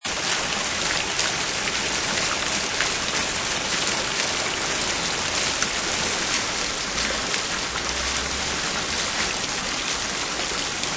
Fountain, Loop - Outdoor fountain in park - Close perspective; Water; Nature